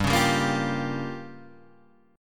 G Minor 7th Flat 5th

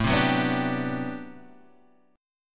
D 13th, guitar chord

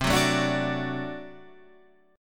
D Major C, guitar

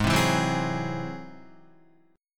Ab Augmented 7th Ab down Guitar; strummed; Ab+7; JGuitar

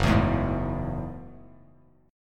Gb Minor 9th, Bass Standard; strummed; JGuitar

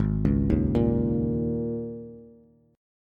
Ab Suspended 2nd Suspended 4th chord, Bass; arpeggiated; JGuitar

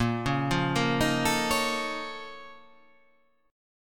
A sharp Minor Major 11th, down

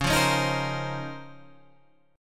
Db Major 13th, Guitar, Drop D

